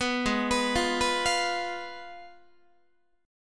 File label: Ab Minor 6th, Ab, Guitar; Abm6; arpeggiated; 14,16,14,15,15,16